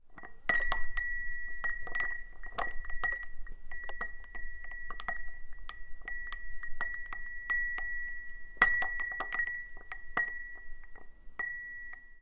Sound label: timbre tama